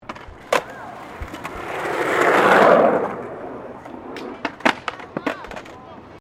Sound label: SKATEBOARD FLAT JUMP; Skateboarding; Sports